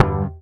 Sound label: SHRT G, Bass; Sampleheads; Will Lee Bass Library